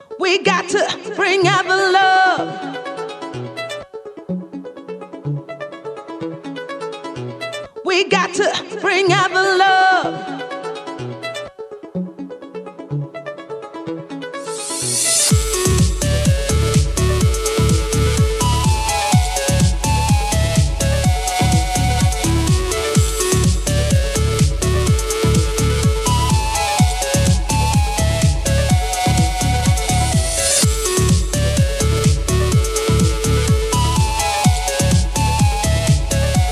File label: happy music